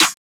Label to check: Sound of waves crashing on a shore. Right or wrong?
Wrong